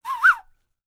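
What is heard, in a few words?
WHISTLE, ANDES; World Voices; ANDES FX; Spectrasonics Vocal Planet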